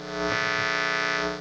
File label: guitar noise; Guitar Artifact #1; guitar effects